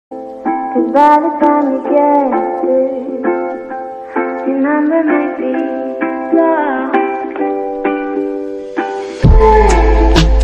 lofi english ringtone

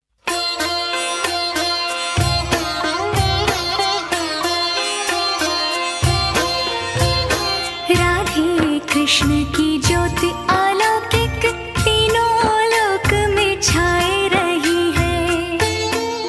hindi devotional ringtone

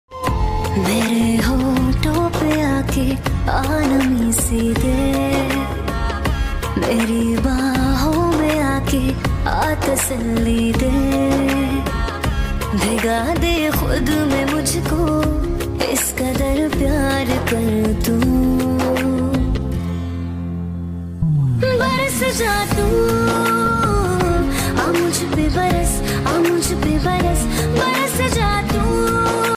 Ringtone

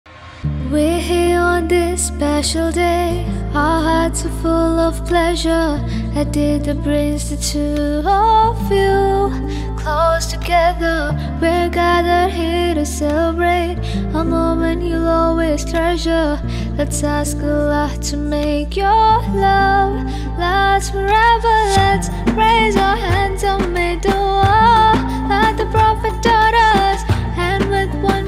arabic ringtone

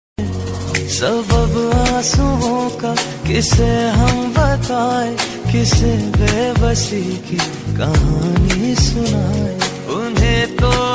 OST pakistani ringtone; Dil Gumshuda

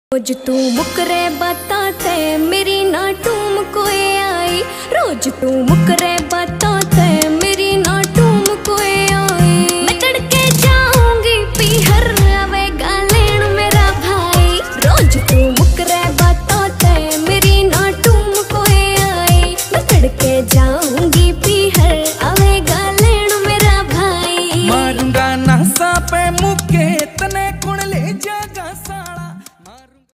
haryanvi ringtone

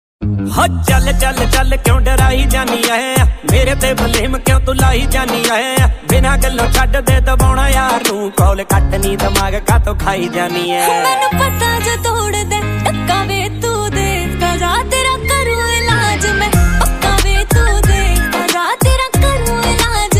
punjabi ringtone

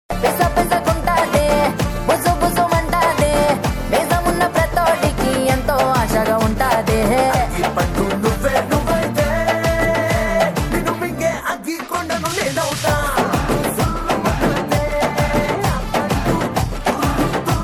tamil ringtone

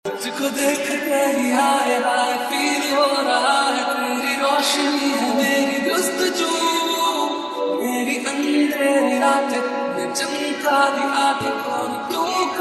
lofi hindi ringtone

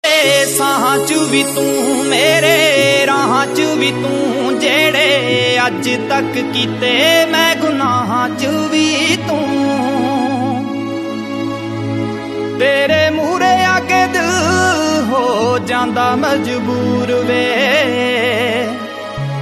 panjabi ringtone

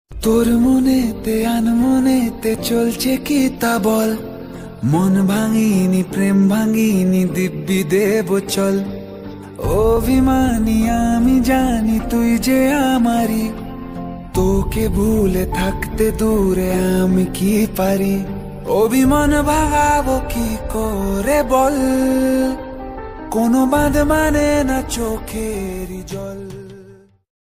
bengali ringtone

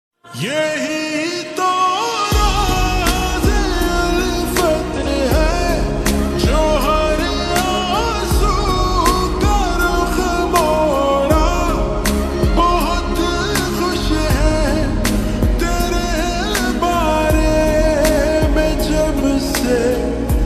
Ost slowed ringtone

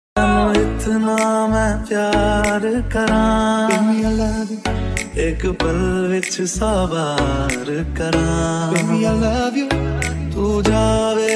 Hindi mashup remix, hindi ringtone

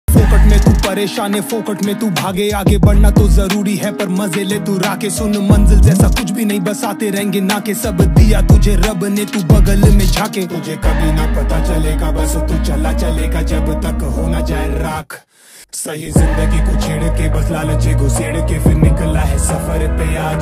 hindi rap ringtone

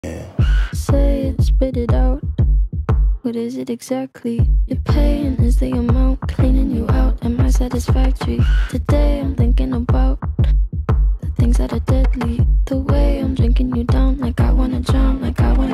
ringtone

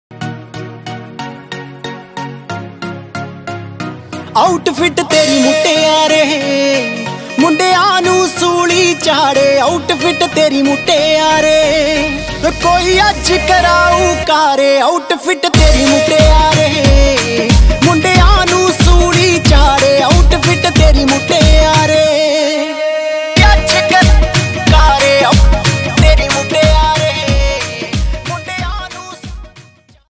punjabi ringtone

